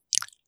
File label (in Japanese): くちゅ音, ASMR